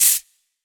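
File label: Shk, shaker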